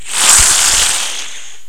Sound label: player launch; Macworld (1998-10); Games World; Shareware Games; Arcade; idin 2.0